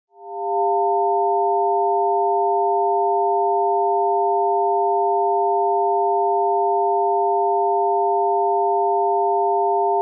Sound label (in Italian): fine allarme alluvione